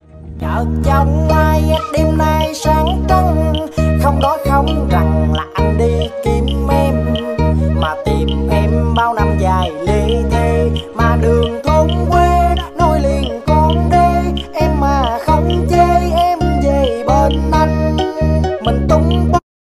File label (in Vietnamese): nhac chuong cho trong ai nal